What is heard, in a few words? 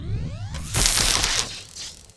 shocklance miss; weapons; Tribes2